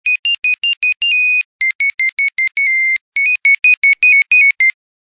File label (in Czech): Melodie Nokia 5110 Charleston